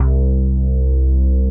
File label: Mookie Bass, Synth Bass; Ableton; Factory Packs; Beat Tools